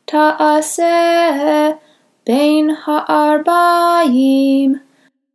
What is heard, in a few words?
rosh chodesh torah reading aliyah 2 phrase 8